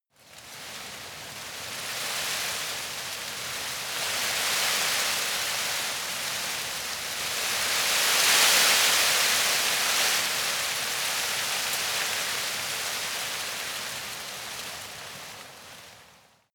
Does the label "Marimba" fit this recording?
No